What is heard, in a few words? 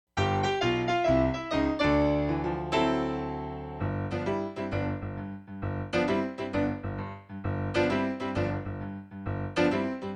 Blues piano track